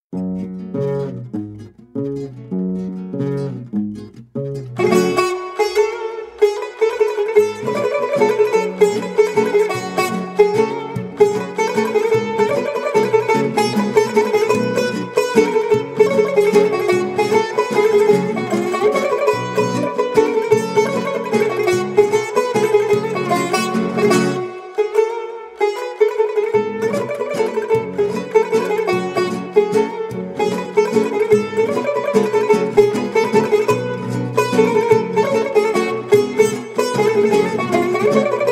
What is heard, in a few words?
Tar Instrumental; Baylarlii; Feridem